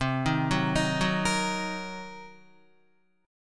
C Minor 7th Flat 5th Guitar, chord; arpeggiated; Cm7b5

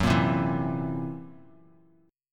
Fsharp 7th Flat 5th down Bass Standard; strummed; JGuitar

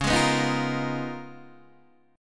C sharp 13th C sharp, Guitar, DADGAD

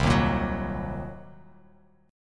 C Minor 7th Flat 5th, Bass Standard; strummed; JGuitar

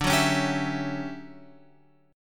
D Major 11th, chord

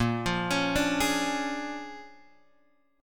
A sharp 7th Sharp 9th, Guitar, Open G

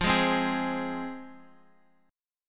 F Minor, F, Guitar; strummed; 4E,4A,5D,5G,5C,6F

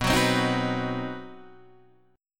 C Minor Major 7th Flat 5th B, chord

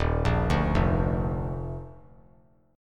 D sharp Major 7th Suspended 2nd; arpeggiated; JGuitar

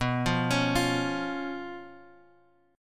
B Suspended 2nd Flat 5th down Guitar Drop D

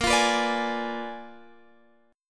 Bb 7th Flat 9th Bb down Guitar Standard; Bb7b9; strummed; JGuitar

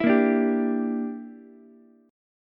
Bb 6th Flat 5th, Ukulele; 9,10,10,10; Bb6b5 strummed; JGuitar

